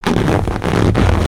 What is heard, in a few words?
Ballloon Animal; weapons; aronwk collection; ndaudio